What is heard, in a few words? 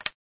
click